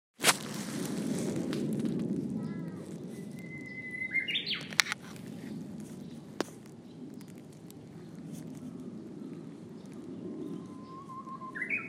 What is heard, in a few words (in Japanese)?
uguisu